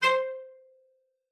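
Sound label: cello spiccato C5, cello; Xsample VOL-5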